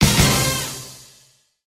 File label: results